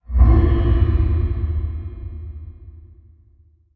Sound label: Bass Impact Dreamy